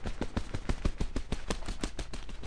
flattern

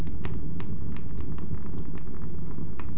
feuer

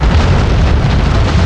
Vulkan; Anno

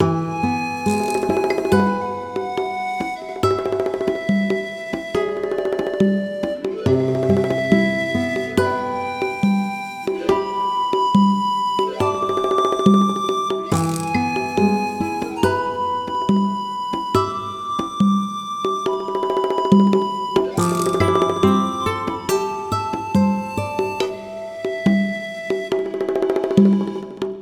MUSIK; MOBICLIC 84

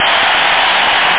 tv klatschen

